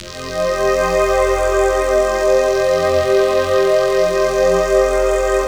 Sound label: PLASTIC BOTTOM; DirectWave; DreamPads